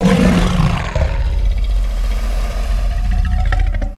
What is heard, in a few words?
Dragon Teeth Door